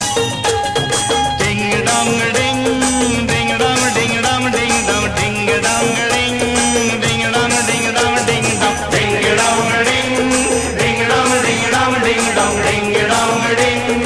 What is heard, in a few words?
Ding